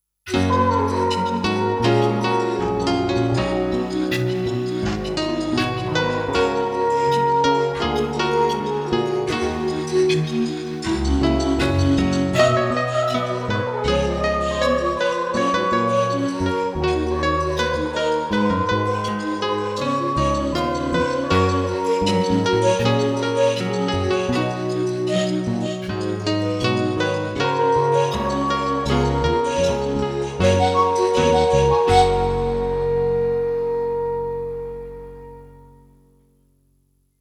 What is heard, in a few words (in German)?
Musik